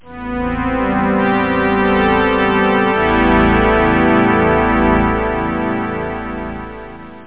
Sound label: theme; CD Gold Vol 1; Amiga-CD32-CDTV